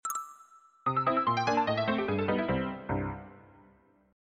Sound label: lose; mario